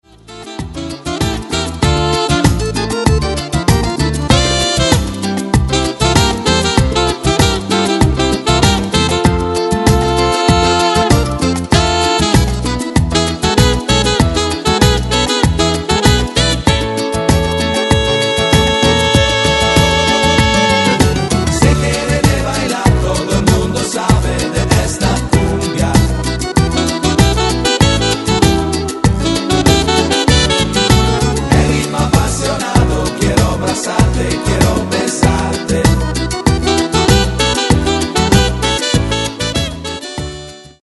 Cumbia

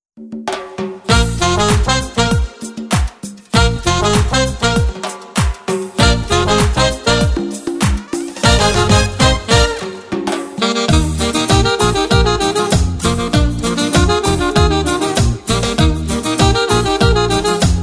Cumbia

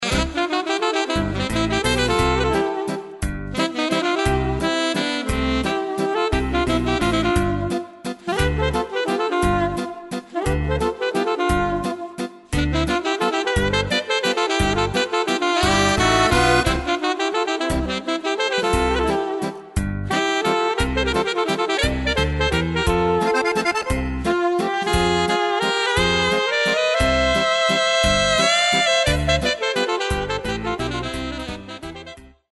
sax

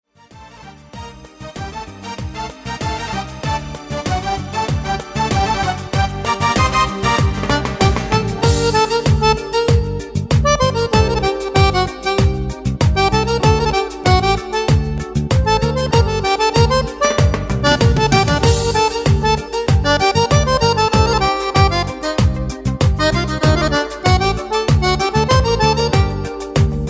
Cumbia

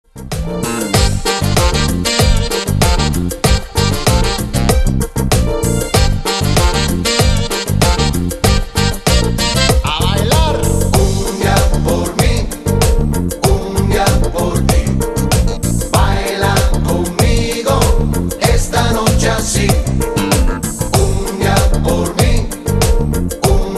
Cumbia

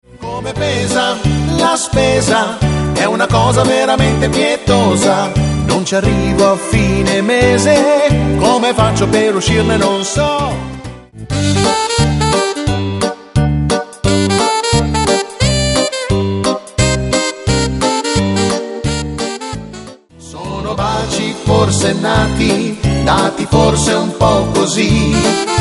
Mix Foxtrot

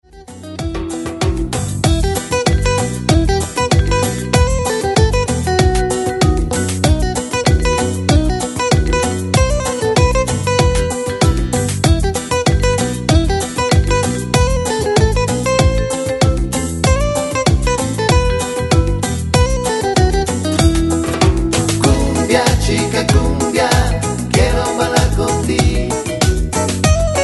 Chica cumbia

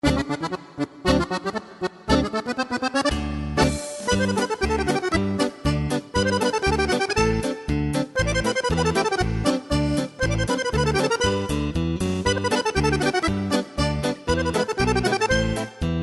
polka

